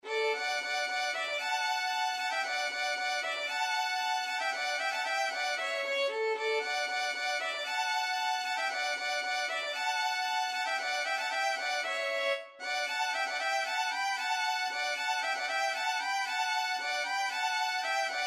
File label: spring violin duet